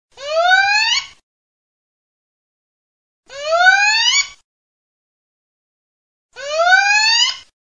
CIVETTA

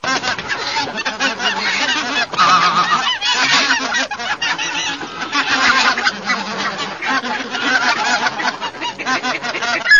OCA GRANAIOLA GRUPPO

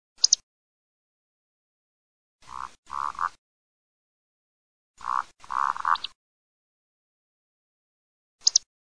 BECCACCIA

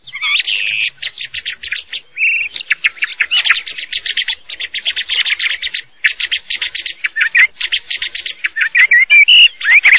Tordo

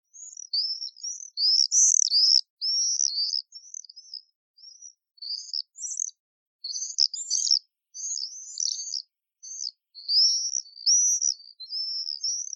Rondone comune

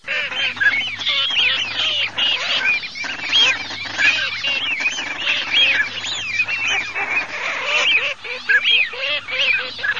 fischioni codoni alza bassa, birdsound

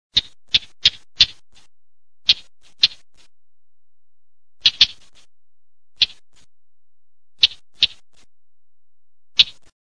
capinera

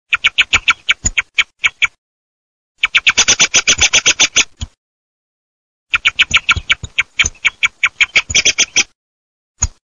TORDO schiamazzo, birdsound

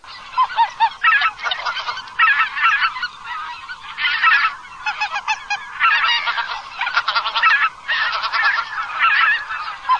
oca lombardella, birdsound

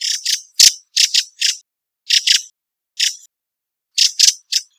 Tordo sassello crecre

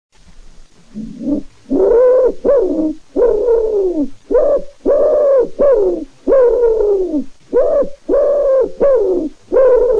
COLOMBACCIO COLOM

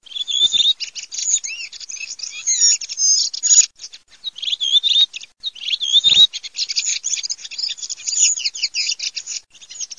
SASSELLO

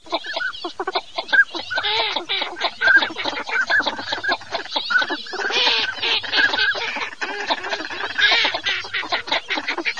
CODONE MESTOLONE

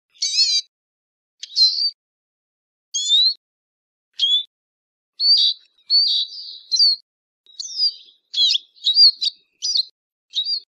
Lucherino

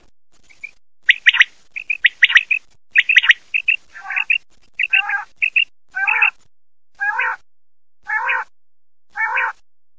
QUAGLIA

